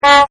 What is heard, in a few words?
HONK, Cartoon; Cartoon Sound Design